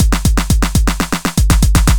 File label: groove, 120bpm; GroovePizza